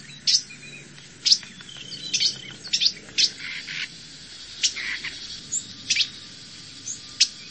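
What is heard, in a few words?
many-voiced chirping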